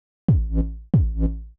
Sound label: Kick Bass A, 138bpm; Zero-G Sound Sense Grime Scene; Construction Kits; Da Suspects